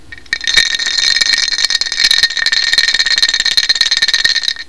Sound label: plastic